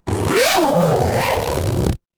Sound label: paper fabric zipper